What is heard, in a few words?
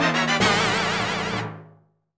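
Fanfare Get Item Dizzy, gameplay sounds; Phantom Hourglass: "Get Item" fanfare (while dizzy)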